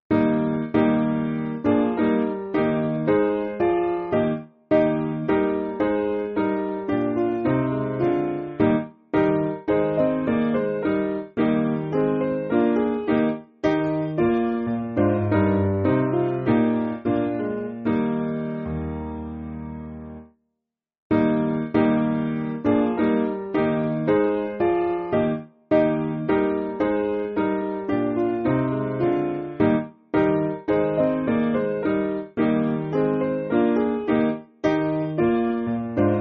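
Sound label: Piano, Music Backing Files for Churches; Samson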